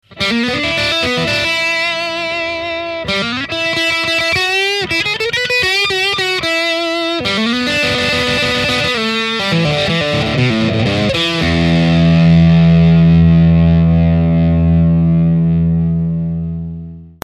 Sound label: Soft Drive Full, Tubedrive; MEK Tubedrive TD-2